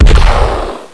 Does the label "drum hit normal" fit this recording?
No